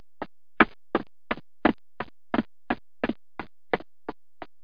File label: step